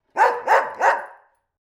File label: original dog bark